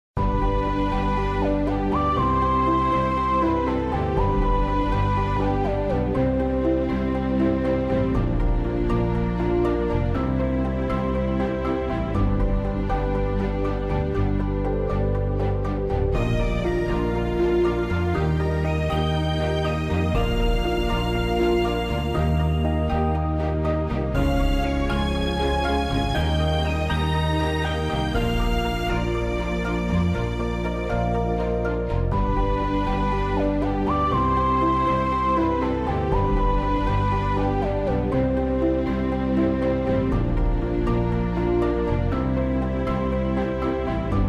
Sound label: Checkpoint; metal slug awakening code j soundtrack; android